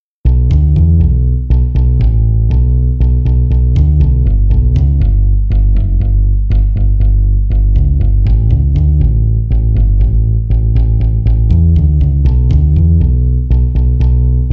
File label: aco bass